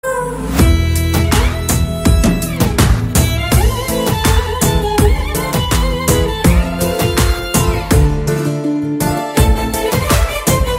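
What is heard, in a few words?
Violin BGM; Pelli SandaD; Telugu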